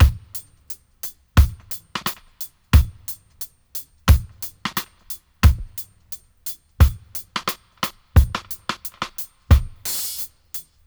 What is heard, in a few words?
88 dry, drum loops, dub; Drumdrops In Dub VOL-1